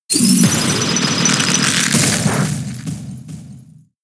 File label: ice beam